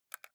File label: console controller xbox joystick press fast